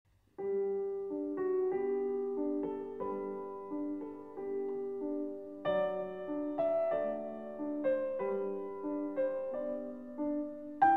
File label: poco adagio; Muzio Clementi